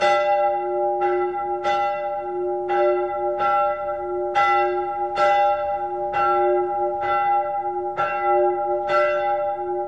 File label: Bell